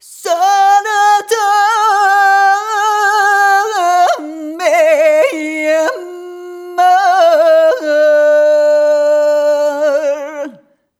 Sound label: Rhythmic G